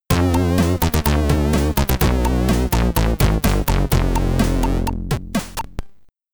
Game Over (SID Stereo); Turn It II; Commodore 64

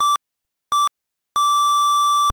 Doctor Failure; life is a game android

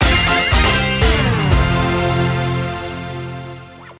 Lose; Boulder Attack 2; Wario

